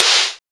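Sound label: WHITE NOIZ SNARE; Drums In Your FACE!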